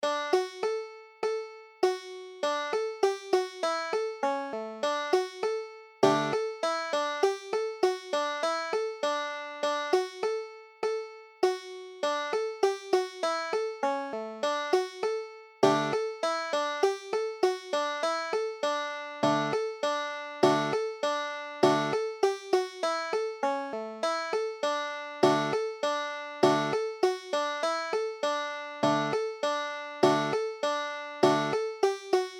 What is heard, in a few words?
molly put the kettle on, banjo